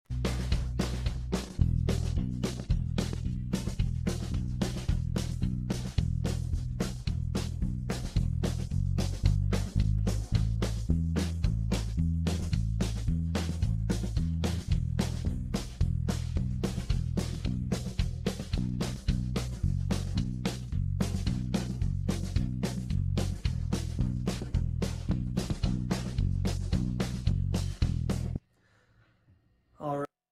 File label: chicken pickin country blues, backing tracks